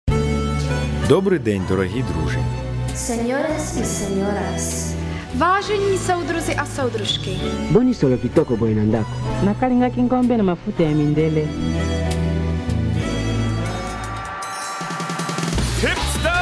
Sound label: worldbeat, jingle